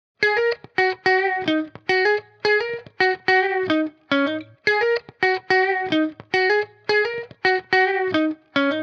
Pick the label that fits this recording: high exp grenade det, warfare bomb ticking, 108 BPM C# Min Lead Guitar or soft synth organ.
108 BPM C# Min Lead Guitar